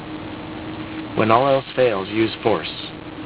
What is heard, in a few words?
8 bit; Speech